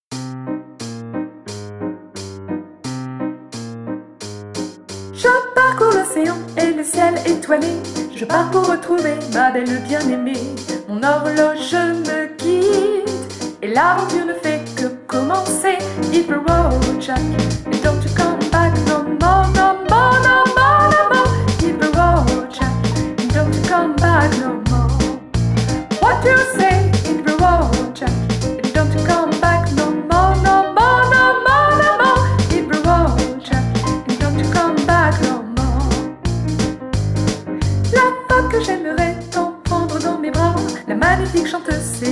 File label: Hit the Road Voix